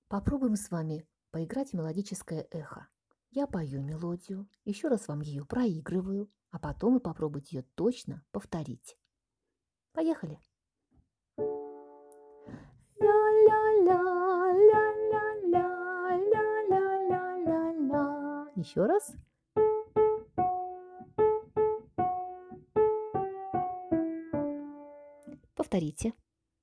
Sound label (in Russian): мелодическое эхо